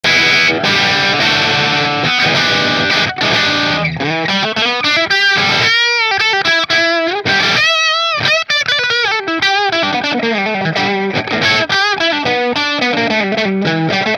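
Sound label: Nola Voodoo humbucker bridge marshall, guitars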